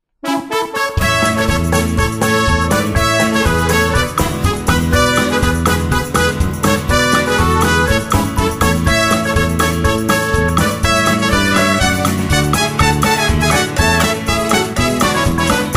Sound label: theme tune